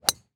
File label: Sports Golf Ball Hit Tee Off